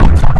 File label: ministrider footstep; npc